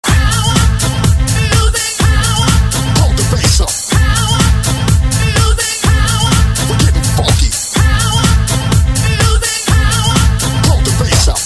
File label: bbox